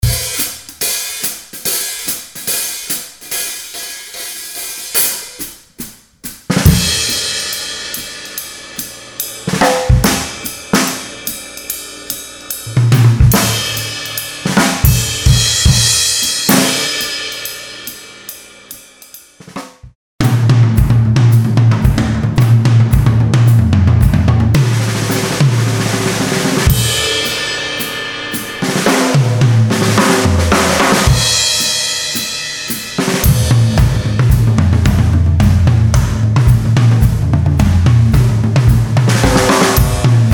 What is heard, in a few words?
jazz